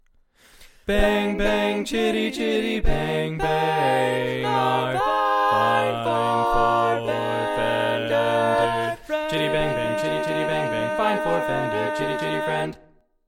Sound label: Bass, barbershop